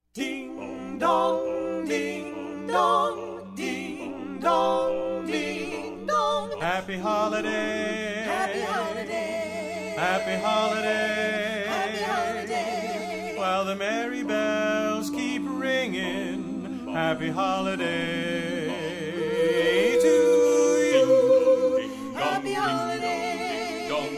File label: Carolers; St Louis